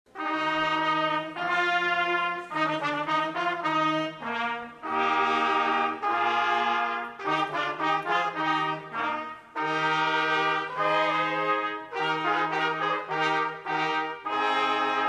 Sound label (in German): Jungbläser Bläserensemble Marsch; Musikschule 2005